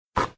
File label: net swish, basketball; inlogic-basketballsmash